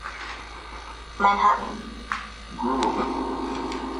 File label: Auditorium Phasma Box; beaver area high school investigation